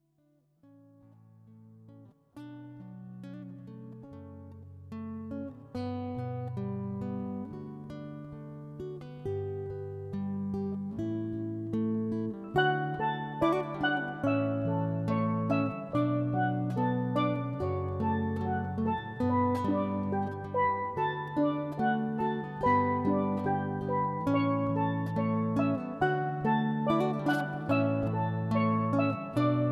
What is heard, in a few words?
Canon D duo